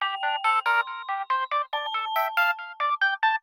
New Ringtone

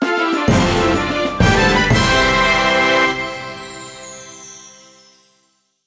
Jingle Special Reward; Kirby's Dream Buffet

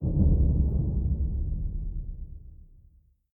rumble low

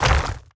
Pound

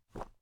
Snow; This Is the Police 2

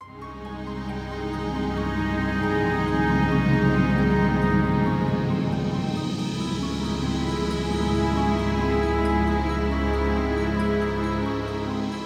office tension; broken sword the sleeping dragon

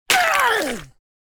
Goblin Die

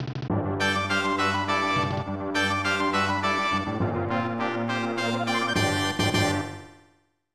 Map Clear

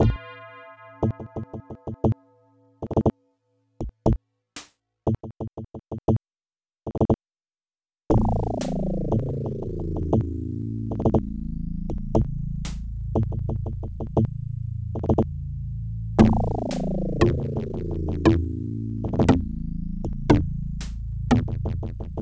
Suspense; Spear of Destiny

